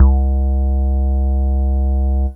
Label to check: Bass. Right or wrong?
Right